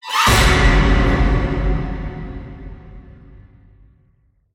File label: Cells Hit; Batman Arkham Asylum gamerip